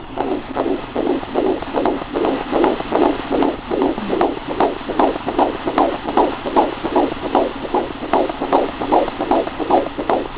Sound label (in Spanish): Latido Corazon